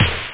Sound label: drums snare; OctaMED SoundStudio V1